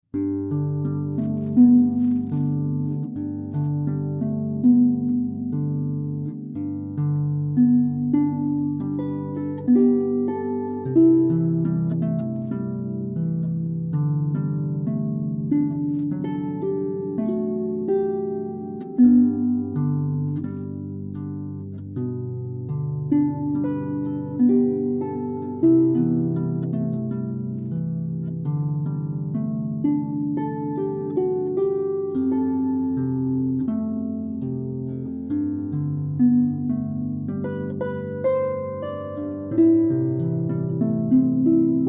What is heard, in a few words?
Harp